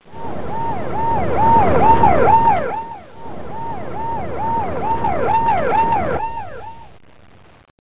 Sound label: ambulan; Busy people of hamsterland